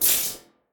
laser gun; arms

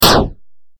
damage; attack

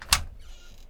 office locker open

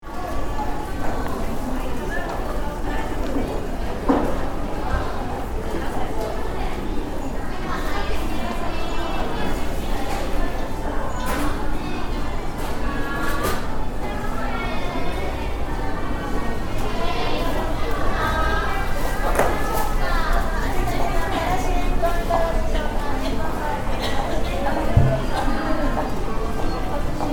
shopping in an airport

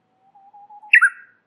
warbler; animals

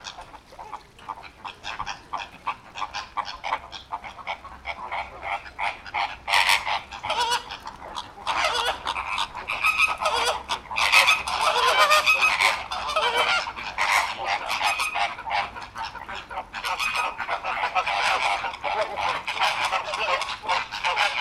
flamingos at zoo; 動物園フラミンゴ群２; animals

